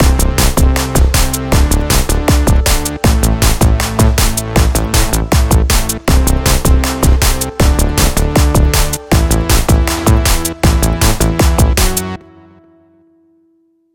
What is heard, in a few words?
groove A 158